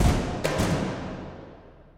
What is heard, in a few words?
Tymp